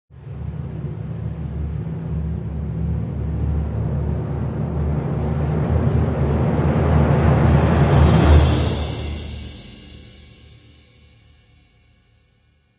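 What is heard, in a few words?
Crescendo Timpanistic; Brand X Music; Brand X Music Catalogue - Editor's Essentials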